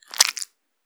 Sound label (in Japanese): 膣内射精